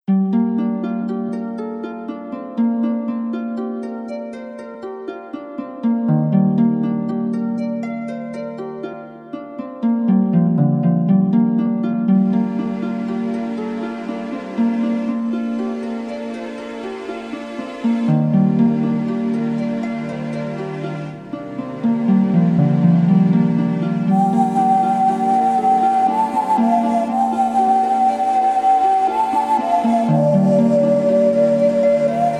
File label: celtic whisper, 432 Hz